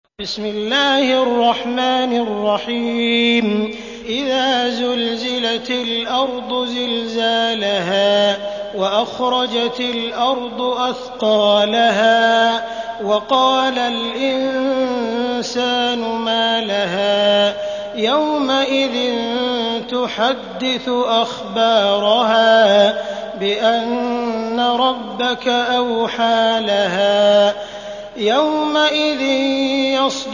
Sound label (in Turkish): Zilzal, kuran; sudeysi sureler